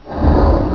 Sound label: kingpin attack